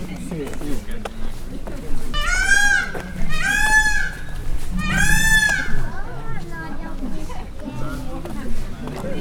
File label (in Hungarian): menet közben, páva; pecsi zoo 2017